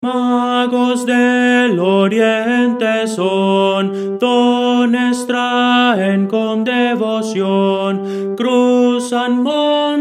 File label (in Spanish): tenor; Voces para coro